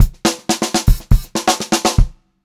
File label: 120 pop fill, Pop-Rock Drumloops; USB Soundscan vol.37; AKAI